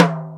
R8 Tom, Roland R-8

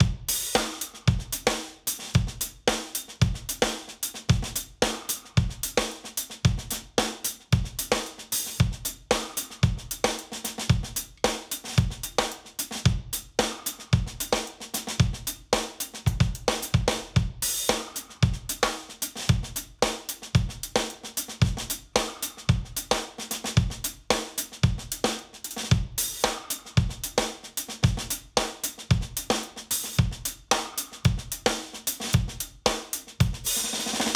Miss U 112 bpm, drums; Basement drums vol 1

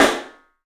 PIC SNR, PICOLO ROOM; 3D Sound

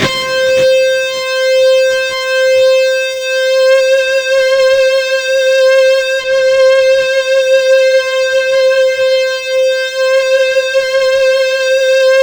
C4, Synth; 90 s Sample CDs; NiceBeats; SynthLine VOL-15

